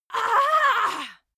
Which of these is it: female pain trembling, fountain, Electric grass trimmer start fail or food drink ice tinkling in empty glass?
female pain trembling